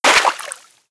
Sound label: debris water, bullet impact debris